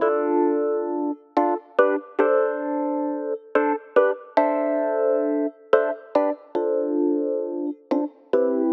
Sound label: Rhodes; Rock; Keys